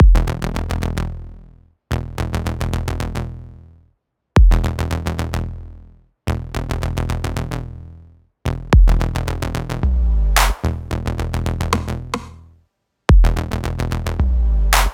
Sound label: zen scale